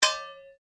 Blade Heavy Moving Impact Ring, Sword; Foley sounds for melee weapons; Swords (Sound Effects:Combat)

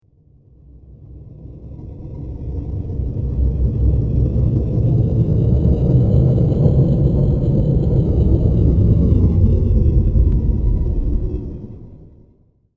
Scary Ambience; Scary Soundscape Sound Effects; horror sound effect

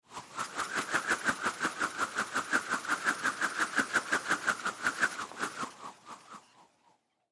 Rope Whip Whoosh Fast Net; Warfare; Sound Design

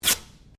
Sticky Tape Rip; common tape; Offices

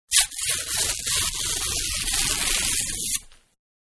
Paper Torn Longest; Materials; Paper-Being Torn-Longest; Manhattan Production Music

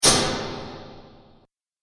Magic Flash Attack Impact; Role Play; Andrew Fleming

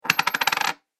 Gold Coin Dropped On Tavern Table; Money Sound Effects

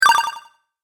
Game Health Points, Video Game Sound Effects

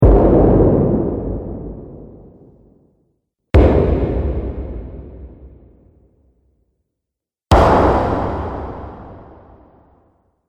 Grenade Explosions; Grenade Sound Effects

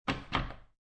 Playing Cards Tap Deck Of Cards On Wood Table

